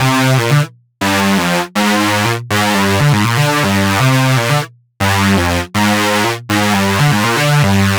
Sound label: Techno Synth